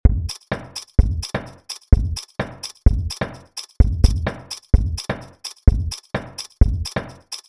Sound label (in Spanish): ejemplo audio shaker